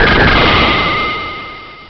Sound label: explode; sci-fi sensation